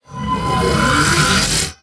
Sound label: Recoup; Seer; GamigoNA